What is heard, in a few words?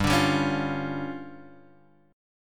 D Minor Major 7th Double Flat 5th G, guitar